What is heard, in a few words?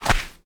sand footstep; metrocraft